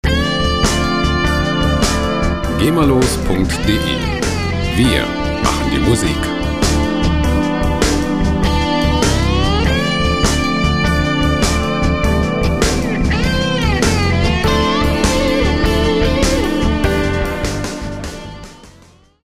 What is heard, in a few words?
shuffle rigs